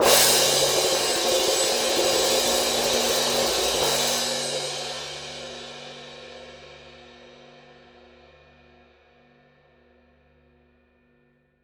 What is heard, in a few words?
Cym trem, Cymbals, Orchestra; 90 s Sample CDs; PowerFX - Downtown Orchestra